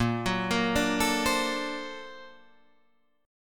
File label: A sharp Augmented Major 9th Guitar Open D